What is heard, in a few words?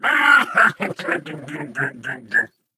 ballbot laugh